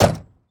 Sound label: Semi Truck Door; Large Vehicles; Blastwave